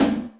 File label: Yamaha RM 50 Toms